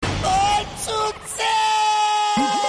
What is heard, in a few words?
notifications cartoon; fukrey boys